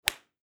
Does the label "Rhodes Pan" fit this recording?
No